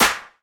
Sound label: studio clap one shot g key; single hits one shots